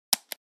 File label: button